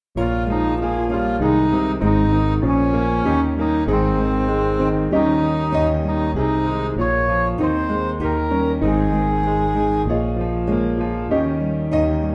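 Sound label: Music Backing Files for Churches; Spread O Spread; Dent Dale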